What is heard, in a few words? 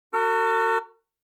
Automobile Horn